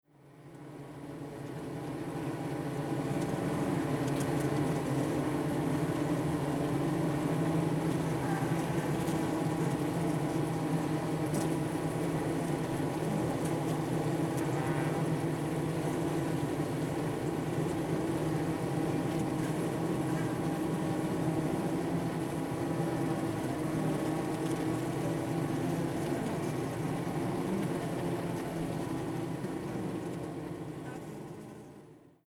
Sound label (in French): Ruche Interieur